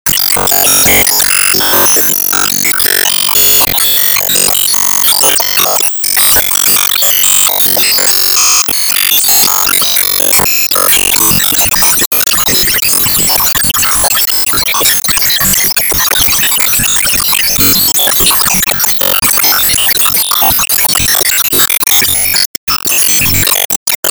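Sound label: Stage Jinglebell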